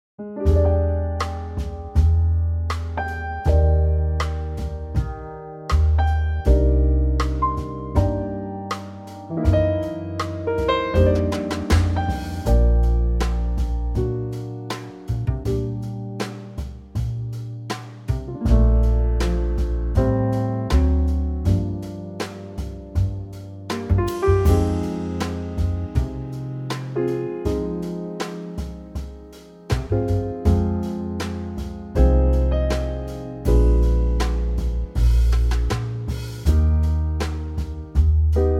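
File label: Bb trio, Backing Tracks for Male Vocals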